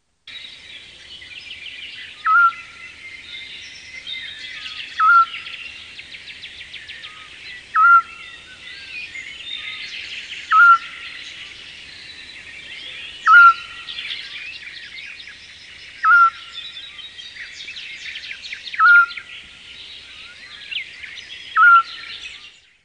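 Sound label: Scops owl; Madarak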